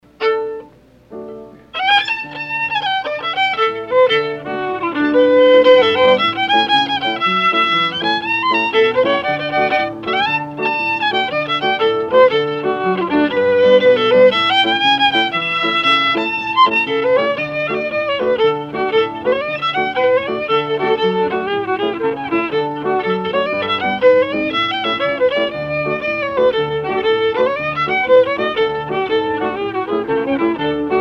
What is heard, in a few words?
Jig